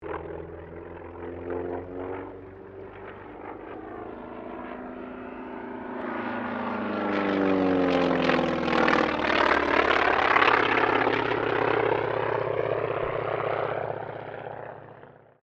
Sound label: AIRCRAFT T-28D TROJAN PASS; Airplanes; Sound Effects:Vehicles